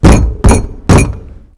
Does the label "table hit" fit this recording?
Yes